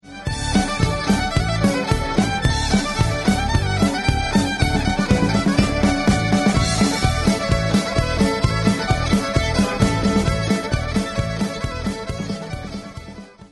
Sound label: fife hornpipe reel set; thefife